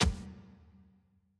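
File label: ambience room polygon punchbag training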